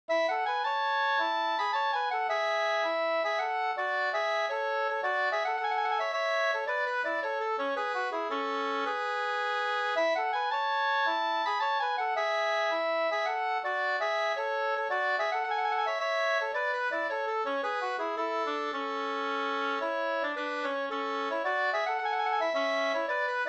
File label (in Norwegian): pols; fr Oppdal el Folldal no V1 2st fu